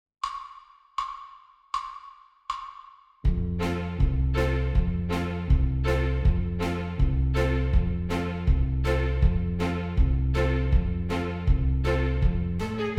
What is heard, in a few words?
Intermediate Daily Warm up F Horns in Fifths